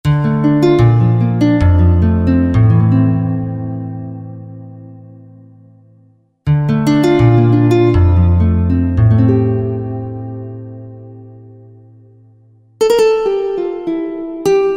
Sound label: Nylon Guitar, Roland V-Synth; Factory Patches